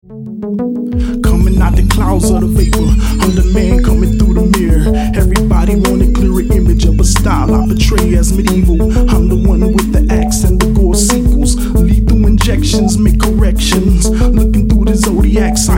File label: hiphop